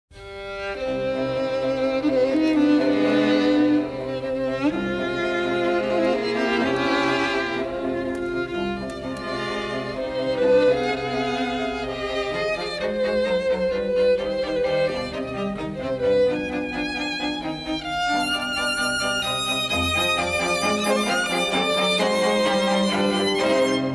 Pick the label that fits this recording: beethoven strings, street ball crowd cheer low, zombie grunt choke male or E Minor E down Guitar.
beethoven strings